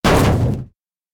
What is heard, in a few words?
bulk metal hit; Smite; Cabrakan